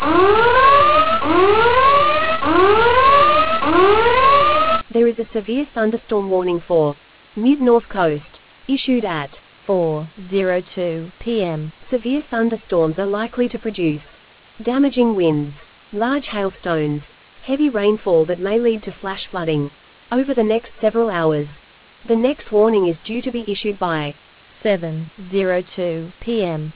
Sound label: storm audio